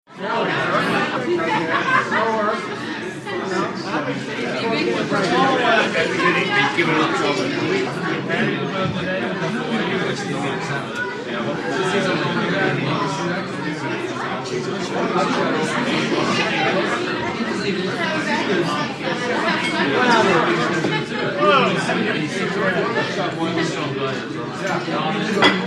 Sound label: Large Pub Less Busy, bars & discos